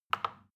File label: plastic button tiny click soft fast